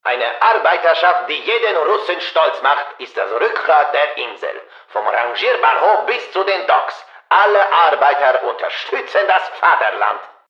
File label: sing